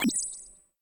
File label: pickup diamond; Crypt of the NecroDancer